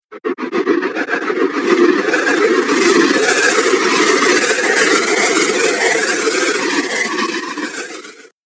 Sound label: Amazing Air Sound, whoosh; Andrew Fleming